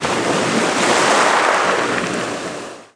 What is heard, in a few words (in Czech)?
Vlny; wob2 demo